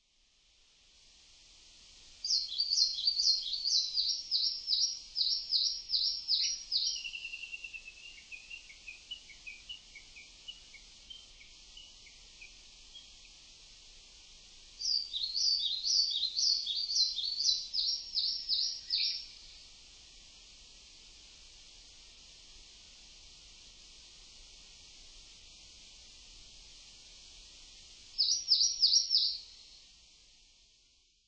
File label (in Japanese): sendai, oshu